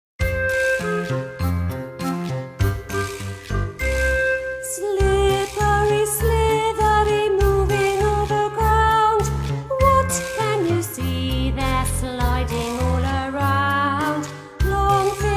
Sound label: Rattle Snake